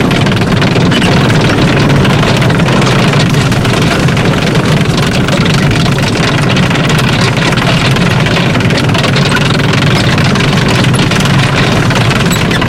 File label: tracks speed, tanks; War Thunder